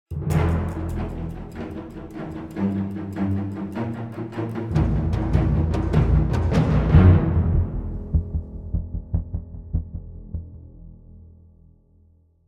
String; Assassin's Creed Unity Dead Kings

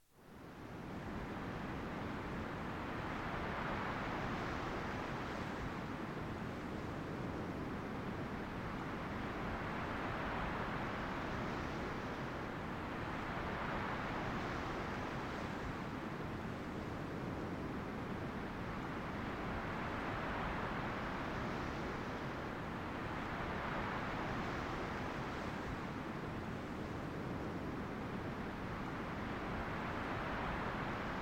Ambience - Lighthouse Overlook; Harvest Moon Light of Hope

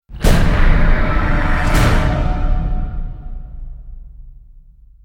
Stinger Action Medium

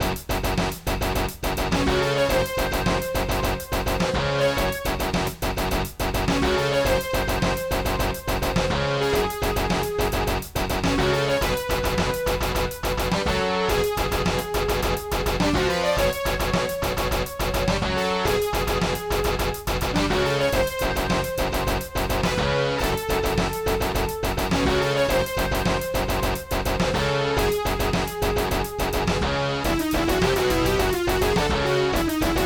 Jungle, Arachno; Blake Stone Aliens of Gold; MS-DOS

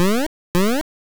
In Love Delay; sfx; attack moves; pokemon; gen 2; gsc

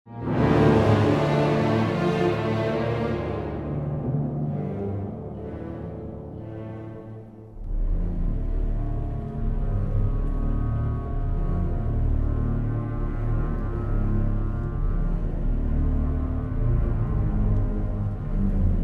City - Ambient; Anakin And Group To Coruscant; Star Wars Empire at War

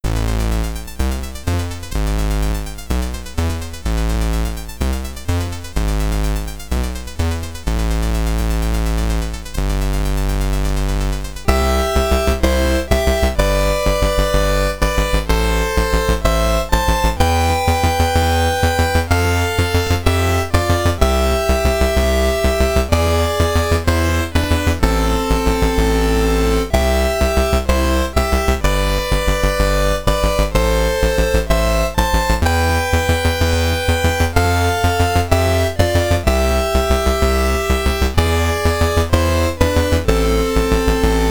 High Score, SID Stereo; Battle Ships; commodore 64

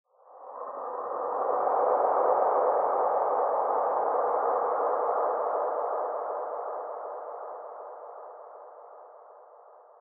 Library Echo Pad

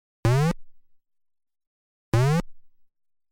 Hero Bumped Into a Wall Sound; Kaijuu Densetsu The Tale of the Shell Monsters

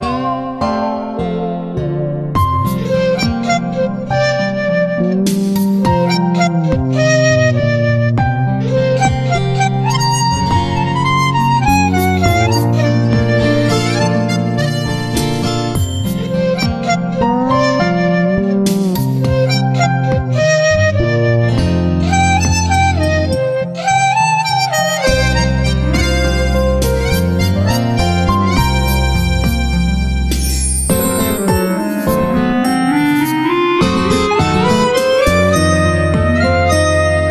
BGM Comical; Magic Sudoku Numpla Oekaki Puzzle; psp

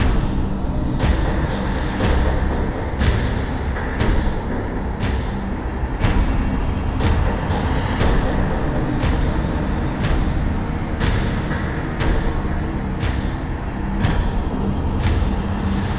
Danger Tonal; Insanely Twisted Shadow Planet

